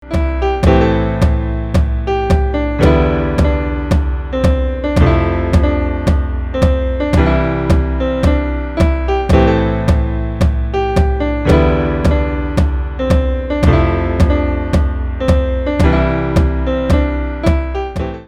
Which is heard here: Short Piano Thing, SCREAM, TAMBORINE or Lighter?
Short Piano Thing